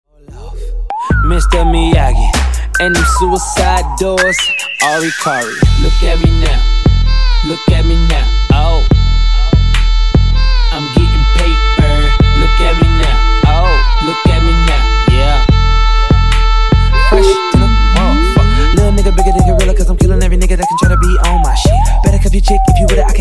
Funk Wordplay Dirty 73Bpm

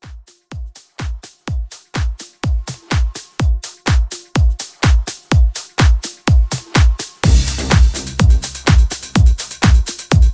80s Classic House Re Drum

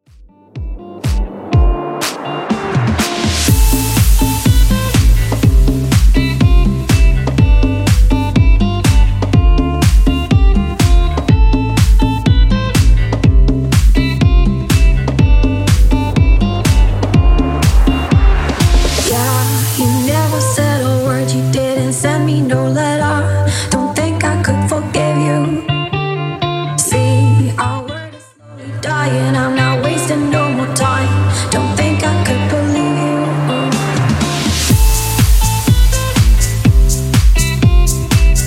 123bpm

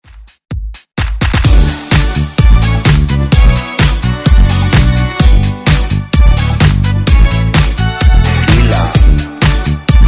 Top40 Redrum

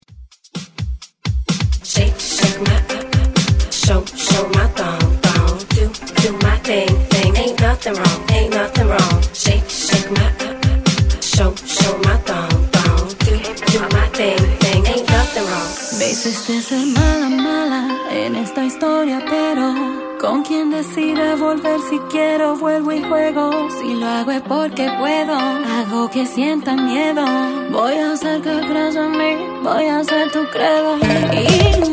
Latin Hype Intro

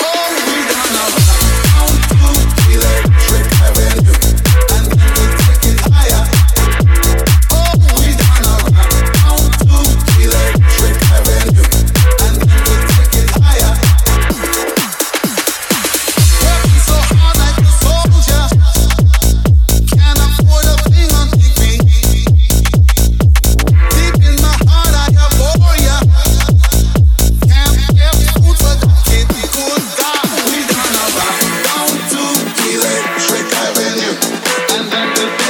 128bpm A EDM

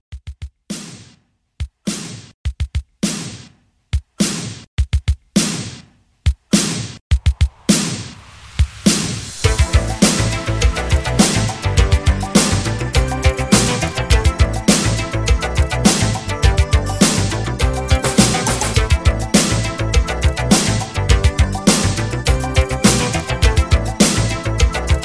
80s Pop Re Drum 103 CLEAN

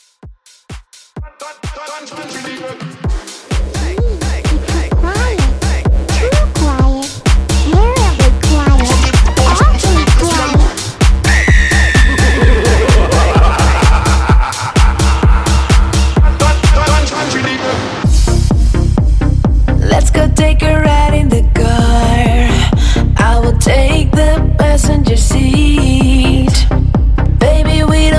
Halloween Bumba Bass Edit

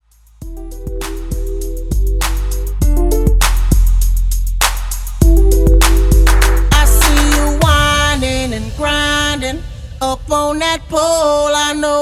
Club Extended Epic Intro 100 BPM

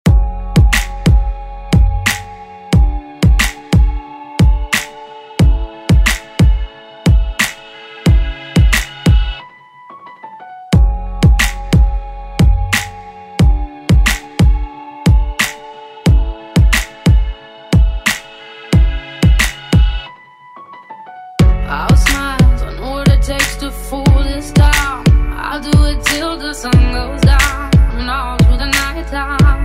Pop Redrum; Deejay Hit

